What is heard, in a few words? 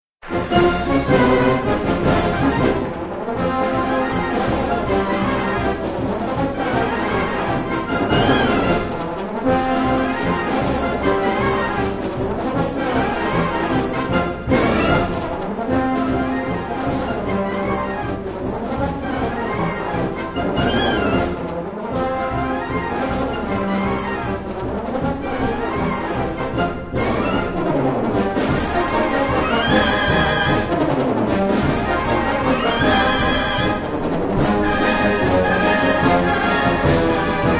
Rolling Thunder March, marchas militares